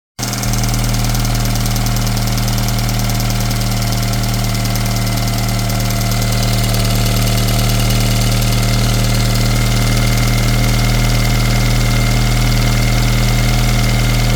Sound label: 2001 honda accord 4cyl